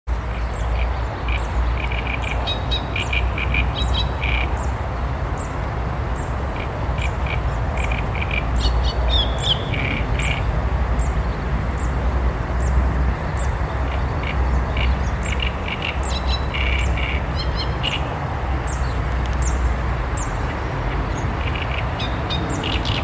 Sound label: A arundinaceus